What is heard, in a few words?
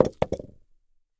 Foley Ceramic Flower Pot With Dirt Set Down Ten Inch